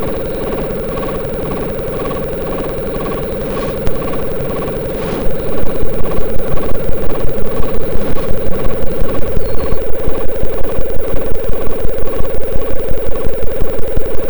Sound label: alien cave, synth; songs of insects